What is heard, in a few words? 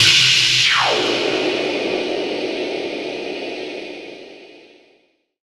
NOISE, Production Effects